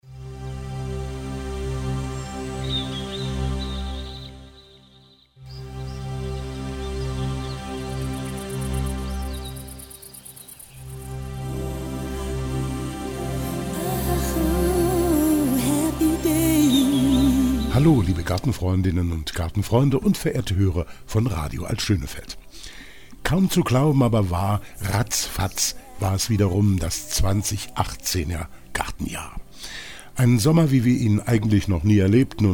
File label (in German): Weihnachtsjingle